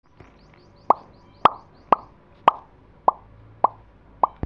pop sound

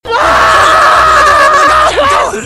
death; delirious

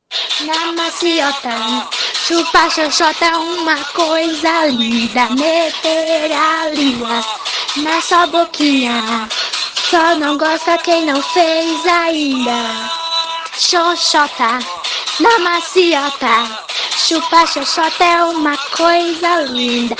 funk

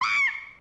mon key screech

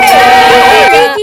musical

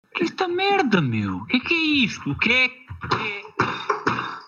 rage

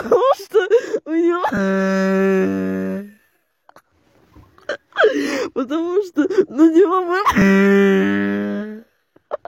lobotomy laught

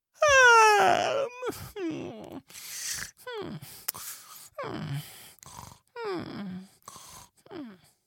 falling asleep

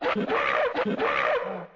scream

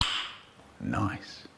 correct nice

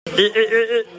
hehehe

